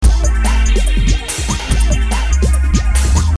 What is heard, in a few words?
loop